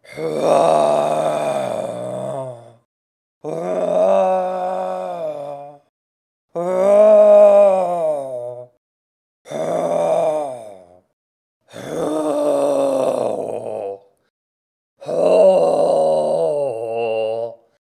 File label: UH sing